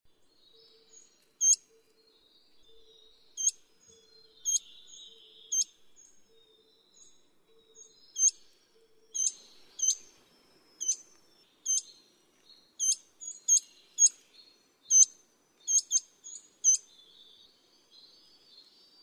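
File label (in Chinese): 灰頭花翼, Yushan National Park; 畫眉科/留鳥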